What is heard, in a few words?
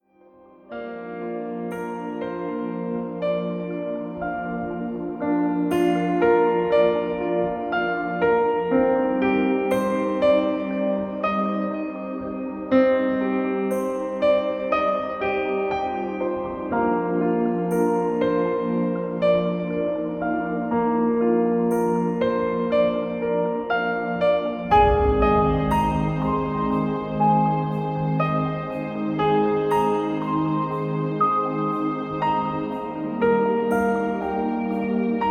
Piano Dreams